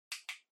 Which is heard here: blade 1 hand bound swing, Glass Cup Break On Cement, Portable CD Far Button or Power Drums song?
Portable CD Far Button